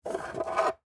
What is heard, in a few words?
Skeleton Key Up, Pick; Foley; Jangling (Sound Effects:Objects); BlastWave